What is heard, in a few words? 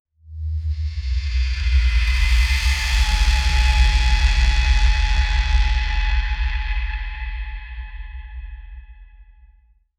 electronic